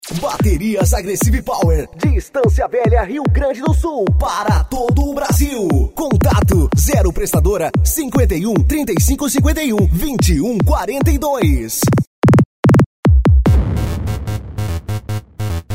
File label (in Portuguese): Baterias, som automotivo; Baterias Agressive Power; som automotivo raiz; Open Format